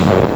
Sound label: farty bed creak